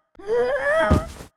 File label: girl dead